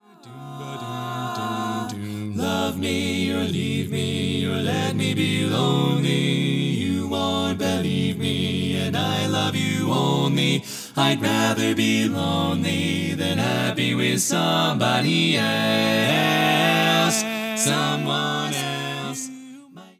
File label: Bass